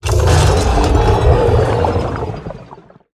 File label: Mecha Shark Death